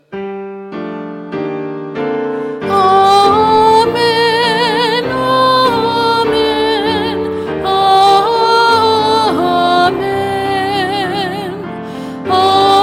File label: Amen; Bell Mass